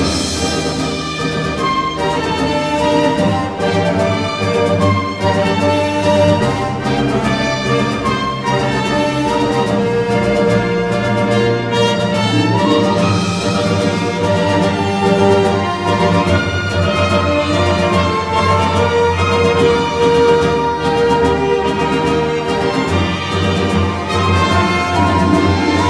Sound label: Orchestra Instrumental Version